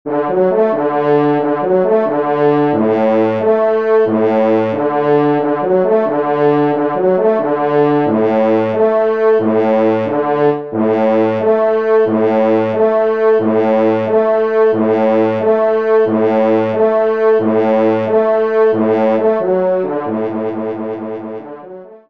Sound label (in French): Rondo, trompe; Les Loisirs du Chasseur n°10; Pupitre 3° Cor; Henri Sénée